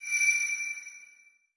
Reward Coins Sparkles; subway surfers city sound effects; Android, iOS

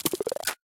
Charge Attack Fast

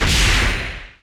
Enemy Gear Active Shot

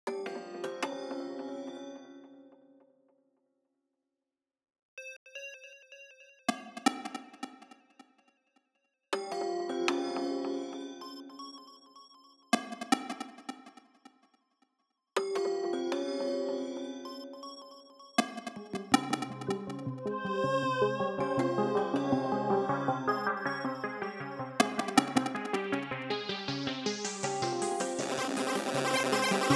SYNTHS; Risk of Rain Returns; Engineer Edition (Returns) (2015)

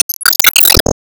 Tap

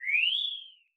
Floatmove; SE Manzai#13 (SE121 Man Floatmove)

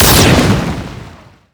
Crusher Shoot; Skylanders Cloud Patrol; Arcade, iOS, Mobile

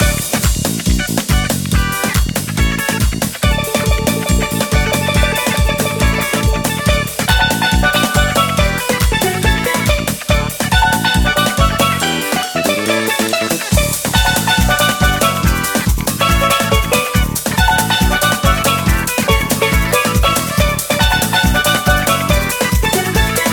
Pirate Town Main Loop

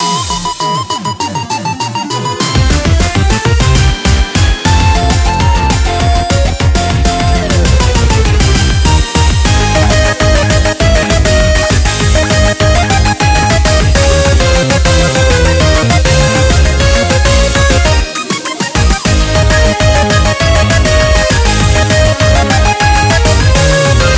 BGM Reach

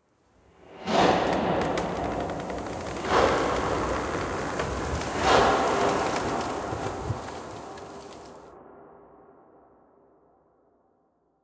claps

